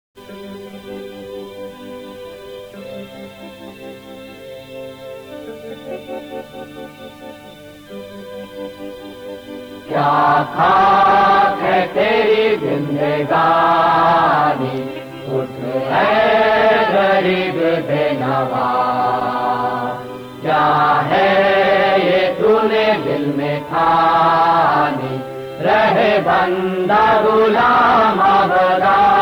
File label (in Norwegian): Internasjonalen Hindi; tonearkiv